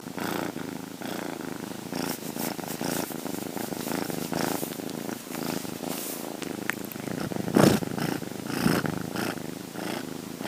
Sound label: purr